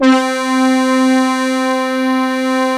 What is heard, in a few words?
ARP, Analog Fantasies; BIG RASS; USB Soundscan vol.49; AKAI